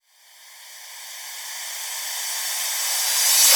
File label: Reverse Cymbals